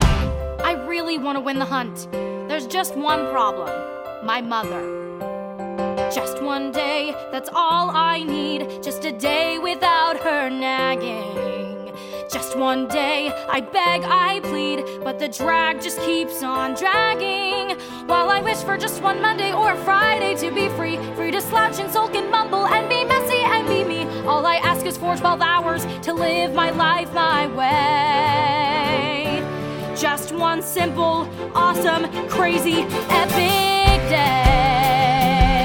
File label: Vocals